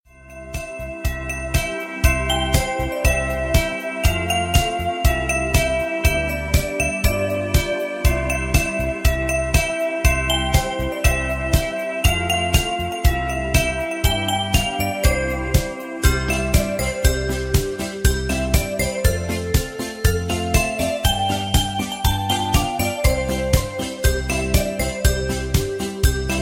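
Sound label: Jingle Bells Instrumental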